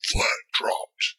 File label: flag dropped